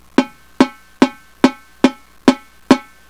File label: plus sixteenth turn plus bridge, banjo